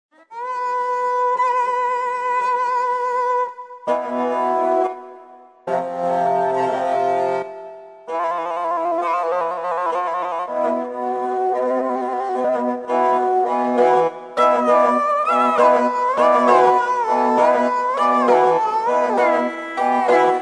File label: Igil, Asian Ethnic Sounds